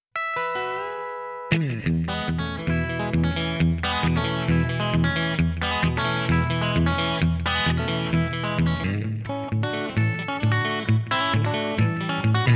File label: rockabilly Black 2x12